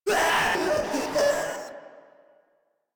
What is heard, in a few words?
Proto Infested player spotted; Warframe; InfestedNinetyNine